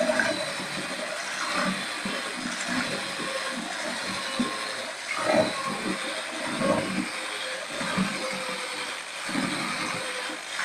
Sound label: loop machine